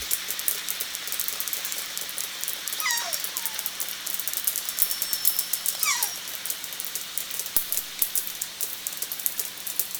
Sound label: varied clicks, SRKW